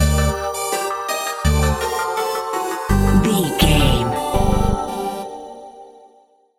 game over video game stinger

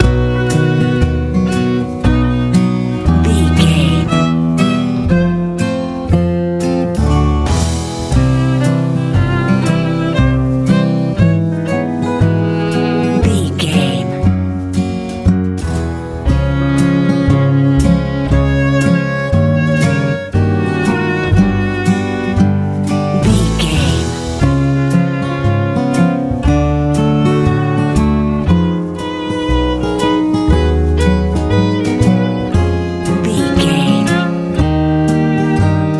sunny hot swing pop

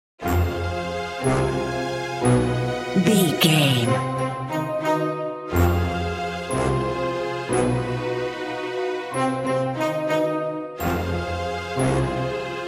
black magic brewing

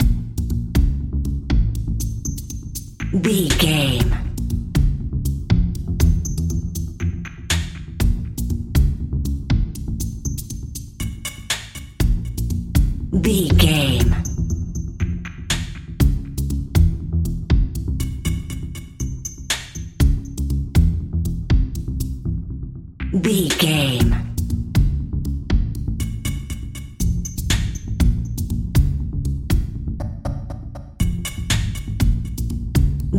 mysterious video game expedition dnb

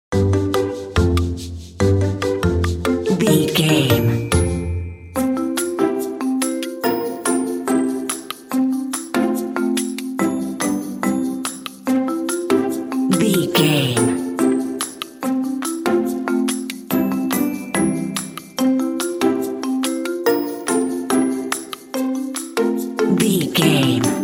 hap hap clappy

